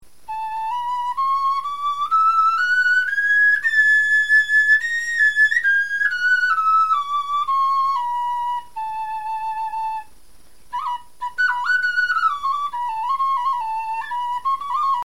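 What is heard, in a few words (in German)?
tonleiter, Knochenflöten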